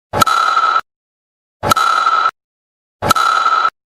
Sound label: Cricket; ورزش